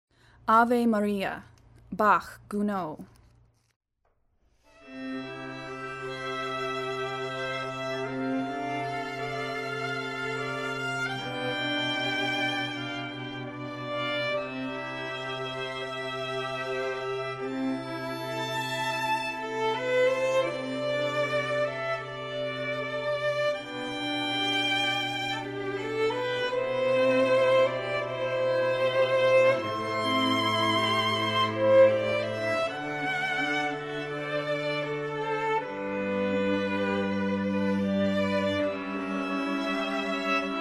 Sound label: Prelude in C Major, Pan String